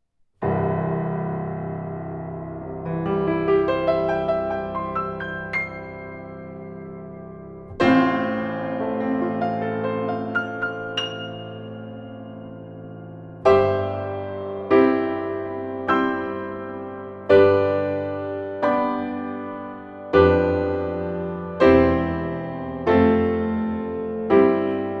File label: big chords